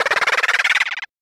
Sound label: Industrial 140